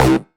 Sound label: Synths Root C